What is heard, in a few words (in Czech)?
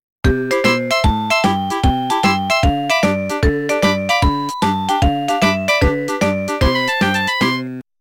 melodie